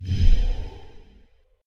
Whoosh Woow

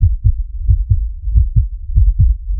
Faux Heartbeat Loop

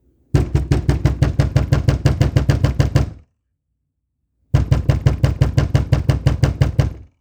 Fast Door Banging

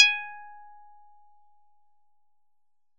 Notification

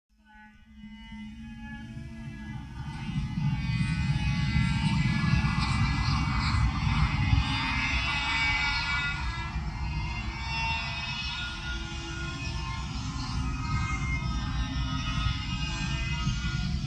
Cello

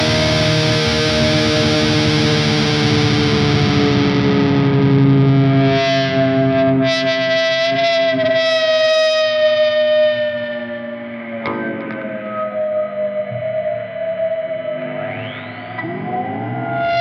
Random Guitar Feedback

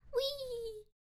Wii

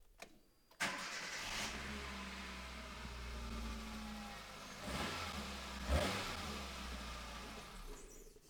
Car Start

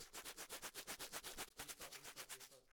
Brush

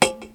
Pluck Can

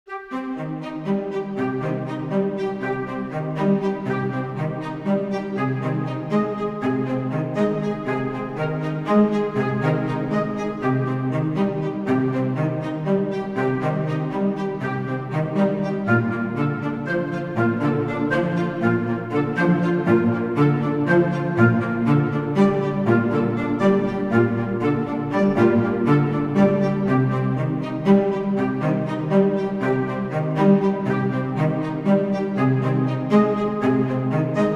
Symphonic Arpeggio Strings Woodwinds

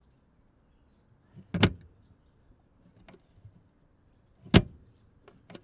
Car Door Open And Close

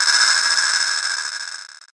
Vibraslap Fake

